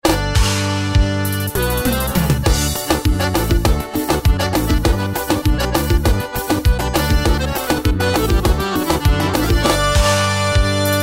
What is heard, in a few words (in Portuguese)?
ritmos korg pa vanera